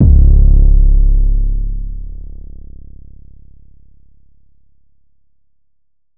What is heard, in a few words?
808 one shot Db; Slate Digital BASSHOLE Sample Pack